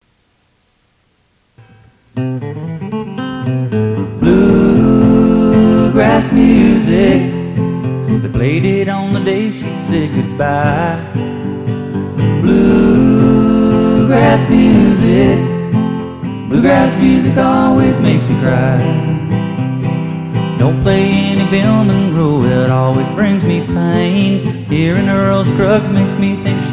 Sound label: bluegrass music